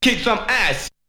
Vocal; ZERO G - Datafile DISC 2

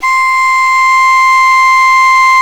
SULING C5; E-MU Producer Series Vol. 2 – More Studio Essentials; Composer

